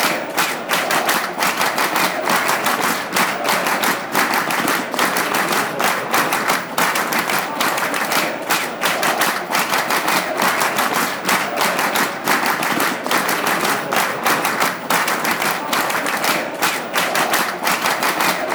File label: clapping; dcfc